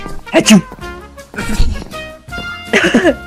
hatchu

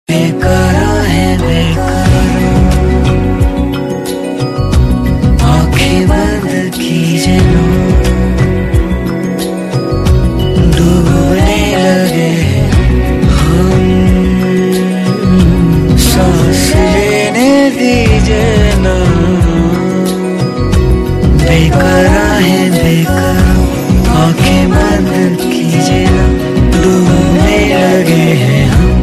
Fast Beat

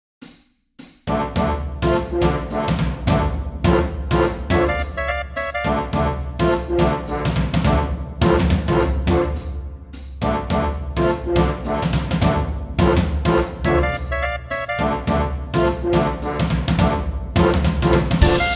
Ringtone Big band

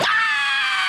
Screech; Erick Andre

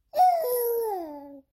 Adorable baby yawn

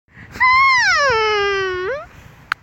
noise

